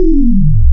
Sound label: Descending Odd, wavetables; Animoog